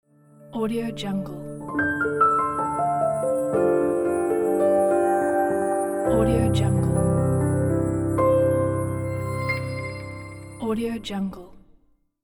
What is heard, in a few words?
piano logo